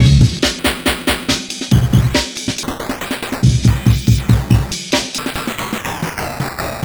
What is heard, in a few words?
Amen Breaker 140Bpm; vst fx bundle